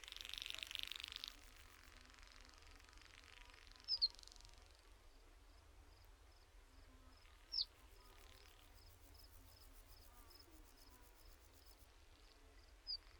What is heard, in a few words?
Sfx Environment Hub Ambience; Akxolotl